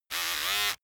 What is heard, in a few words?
servo